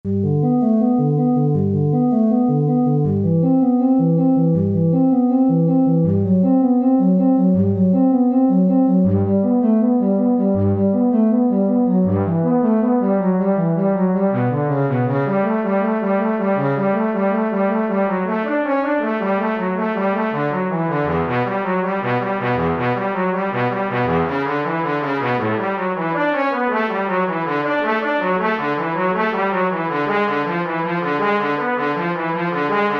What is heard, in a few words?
Flute Brass